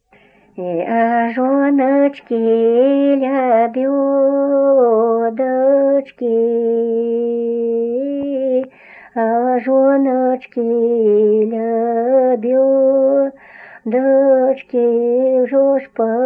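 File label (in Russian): Жнивные песни Себежского района; д. Дедино; "(И)а жоначки - лябёдачки"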